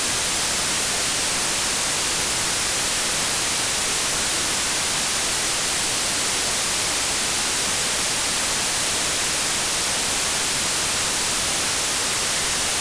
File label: full spectrum white noise